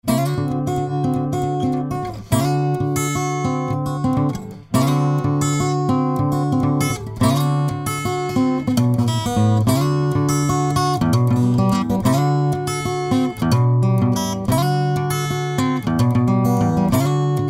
PICKING

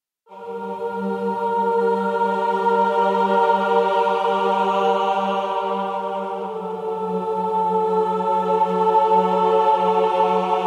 VOCALISTA

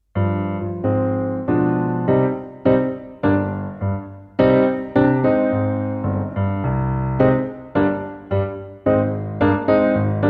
EXPRESSIVE KEYS

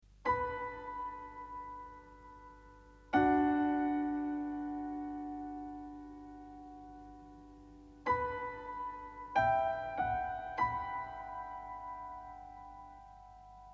PIANO

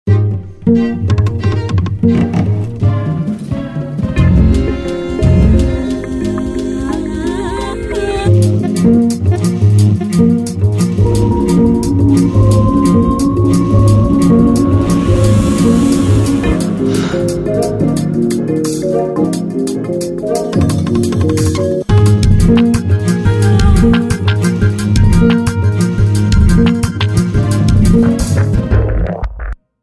JINGLE

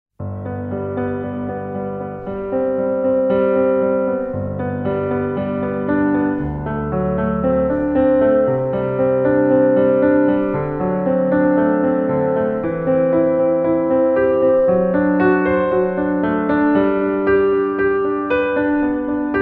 CHAMBER STRINGS AND KEYS

